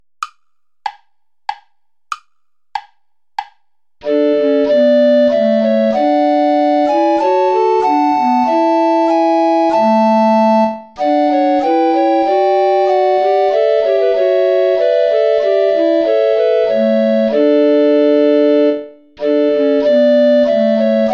play along without tenor recorder; bach johann sebastian

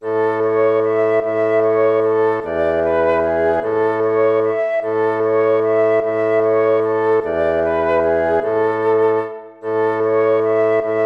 waltz; Carulli Ferdinando

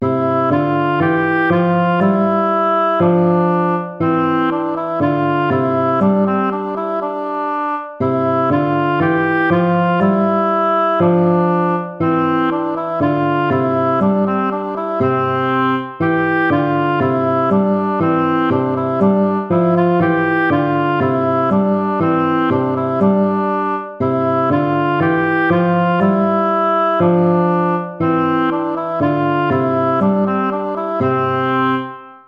gavotta; hook james

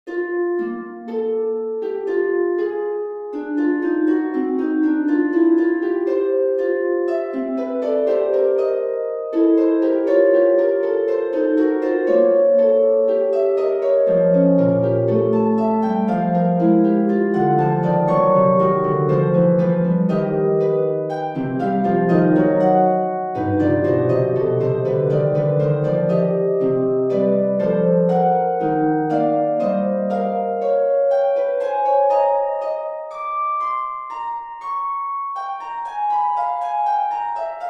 fughetta flat major

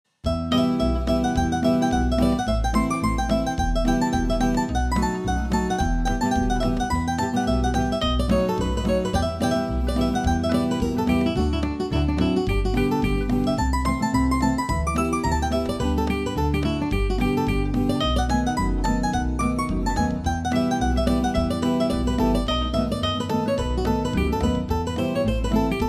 bluegrass

